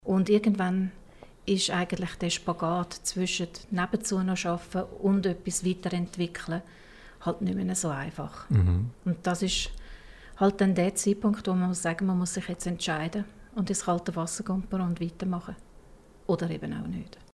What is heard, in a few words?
Upbeat Quote; ALMANDIN TEC